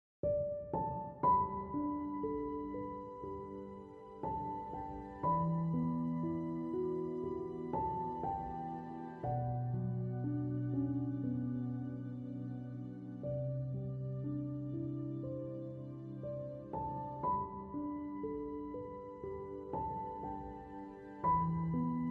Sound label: baby lullaby music